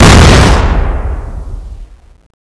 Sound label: bomb